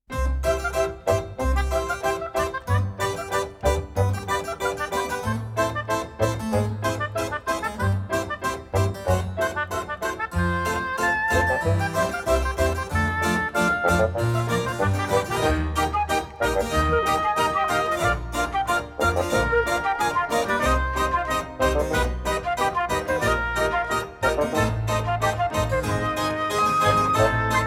mock baroque

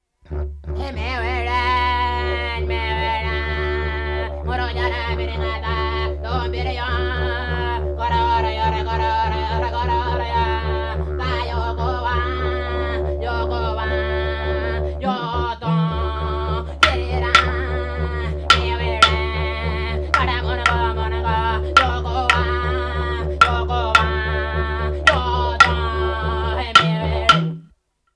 voice clap sticks and didgeridoo

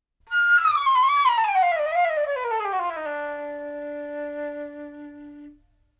flute fall

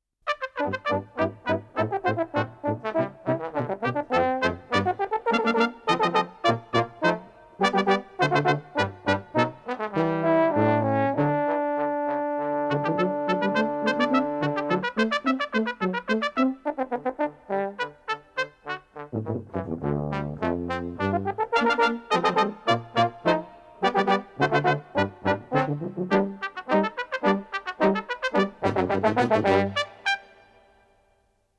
busy brass band

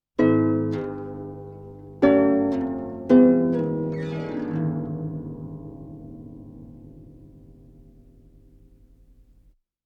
harp hazard

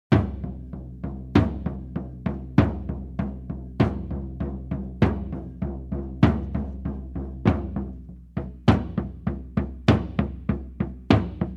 native indian drums

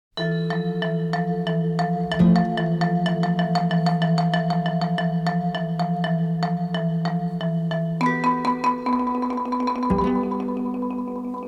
asian abstract

